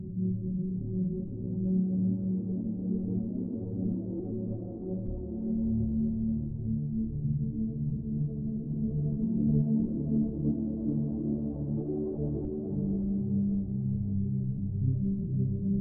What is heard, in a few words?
Ambient Fog; Game rip; MiSide